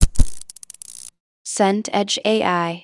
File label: fluorescent tube start